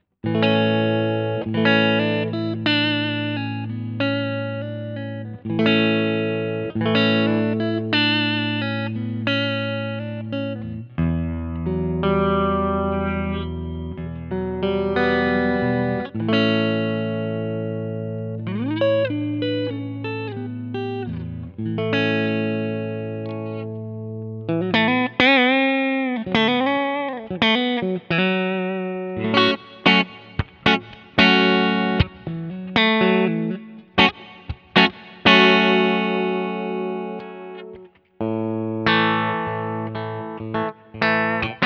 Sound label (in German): Fender Gold Foil Jazzmaster Pickups einzeln